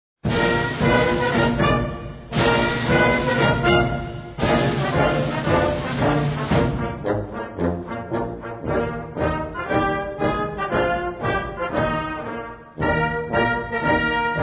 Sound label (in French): hymne monegasque